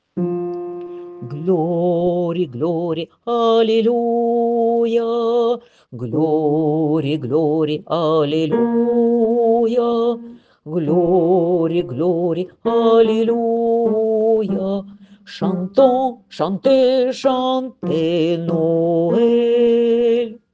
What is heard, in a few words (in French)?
Glory alleluia voix grave des femmes refrain